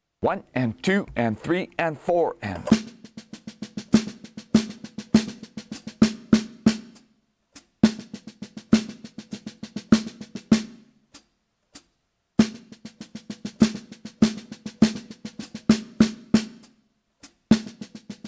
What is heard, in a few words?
Melody 16th Notes